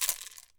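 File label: COWHORN RATTLE, PERC; AKAI S6000 CD-ROM - WorldSounds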